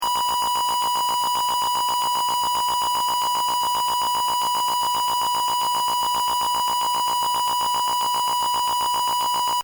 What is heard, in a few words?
music bonus